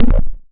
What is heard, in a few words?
Button